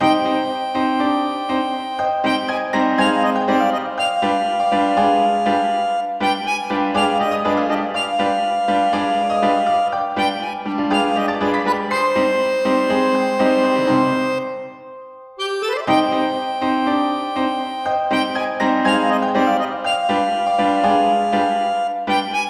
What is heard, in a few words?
tango